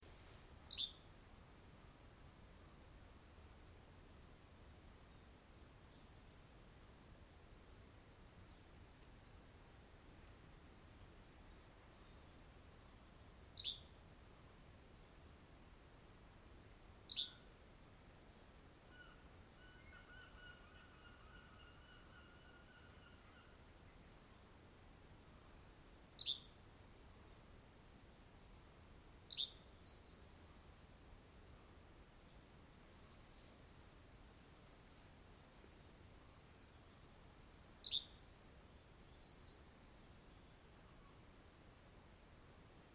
SONG - Yellow-bellied Flycatcher - Empidonax flaviventris